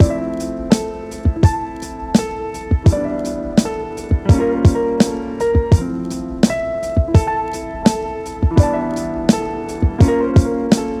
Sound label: Piano Bounce